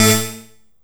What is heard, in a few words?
Synth Hit A